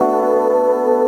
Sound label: Pad; Sonic Foundry (Sony Creative Software); Synchro Funk